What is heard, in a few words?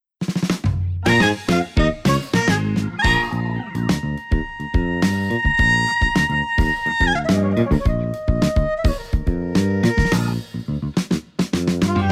Brass